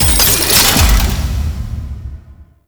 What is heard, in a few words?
Locked Chest Open; Ambience; Props; Warframe; Duviri